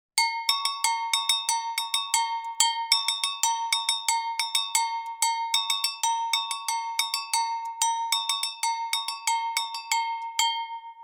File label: Agogo